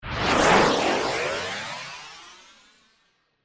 Whoosh Laser Ascend, Whoosh, Heavy, Metallic, Production Element, Imaging Element, Accent, Transition; BlastWave